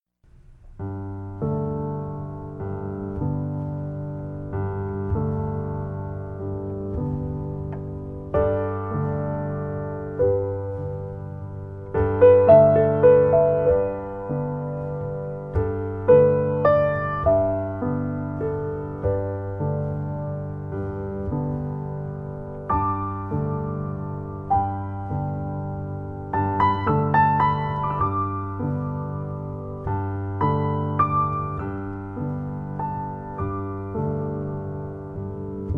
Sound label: Pulses, ballet music